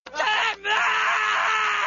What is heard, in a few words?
scream; timmy